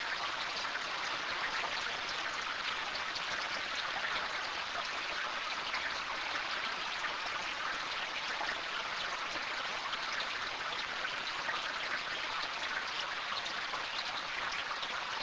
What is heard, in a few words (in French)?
temps pluie